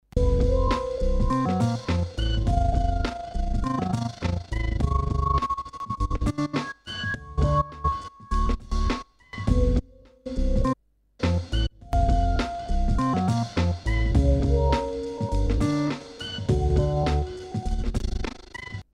Numark v7 tremolo

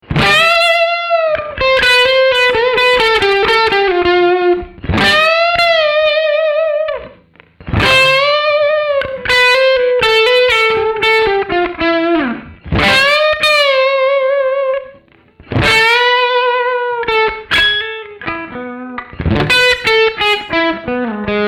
800 high cut

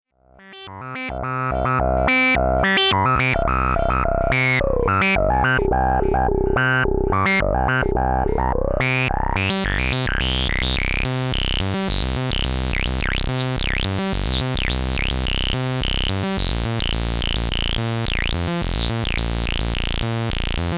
OSCar filter reso

